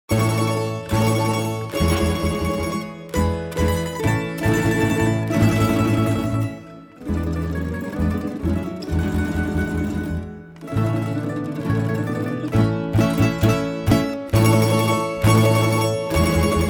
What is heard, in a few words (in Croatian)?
tamburaski orkestar; Runjanin; Lijepa nasa domovino